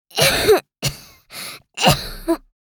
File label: Young girl coughing